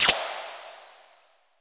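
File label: RATCHET; Crypton - DrumBase5000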